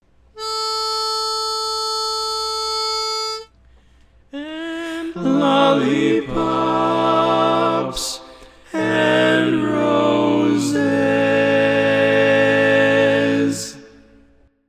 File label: Lead, barbershop tags